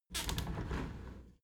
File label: window handle squeak turn open halfway soft; objekte; fenster